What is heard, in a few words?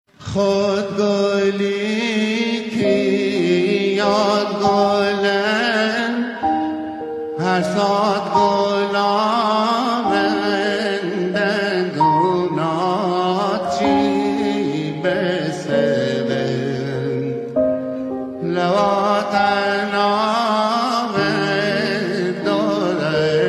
Piano